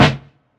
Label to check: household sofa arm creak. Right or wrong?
Wrong